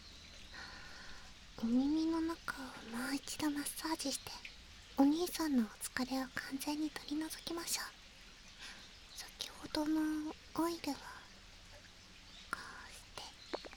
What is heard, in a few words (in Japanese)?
【左】オイル指耳かき, ASMR